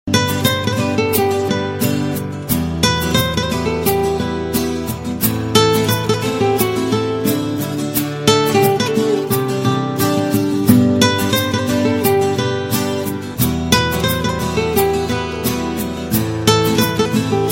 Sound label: guitar